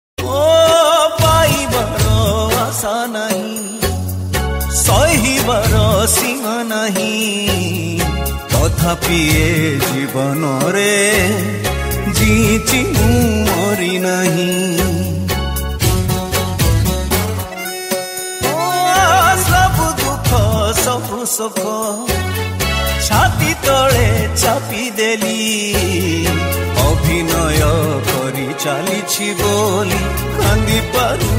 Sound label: Odia Song Ringtone